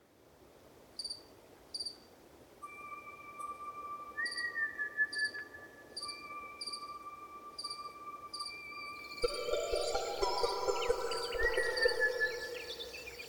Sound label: Dawn Birds in the Sage; Nick McMahan; Wind Is the Original Radio Fridays